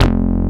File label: FUNK BASS